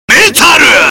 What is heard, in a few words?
Metal